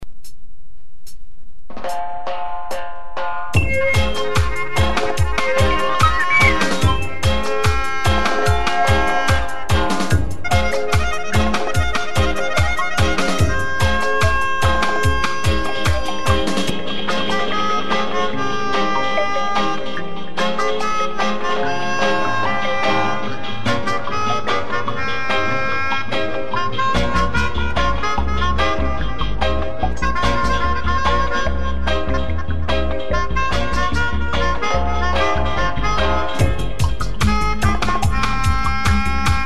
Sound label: universal beat